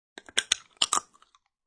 Biting shell of pistachio to get to the yummy goodness.; Biting Nut Shell 1; Eating; Human